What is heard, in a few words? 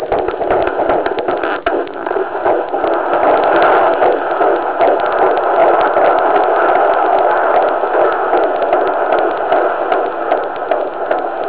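heartbeat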